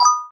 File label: turn notification